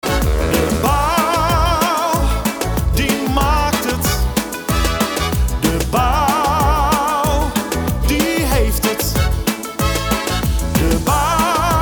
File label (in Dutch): ringtone